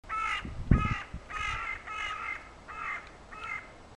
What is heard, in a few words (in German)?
Rabenkrähen